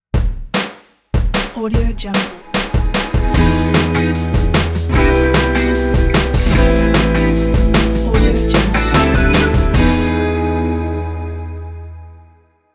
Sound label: fashion lounge funk logo